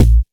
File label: Kick, Data Mosh Hits; Kicks; 99Sounds; Mangling Audio